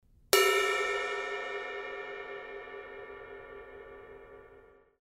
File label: K Con Med Ride Bell, cymbal